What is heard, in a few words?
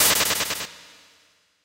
Battle flee; Pokemon Solar Light & Lunar Dark 1.0.1